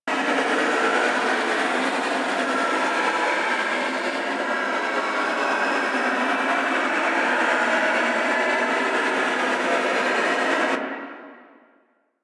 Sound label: Aura Projectile Loop; Warframe; Animus; Anima Animus